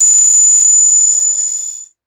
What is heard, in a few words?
descending digital chime notification tone